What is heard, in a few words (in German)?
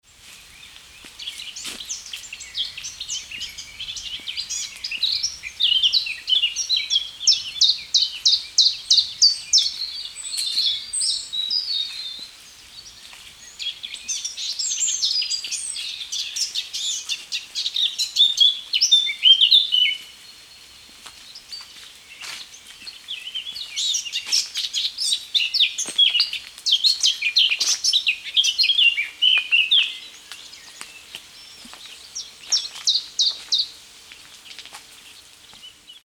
Obstwiese Voegel